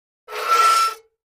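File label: Metal Scrape On Cement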